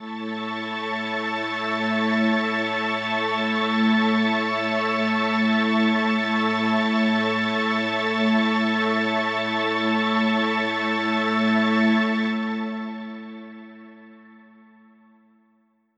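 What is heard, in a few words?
Ambi String A major, synth orchestra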